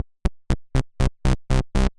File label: step sequencer gate